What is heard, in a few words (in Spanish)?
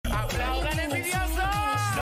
aplaudan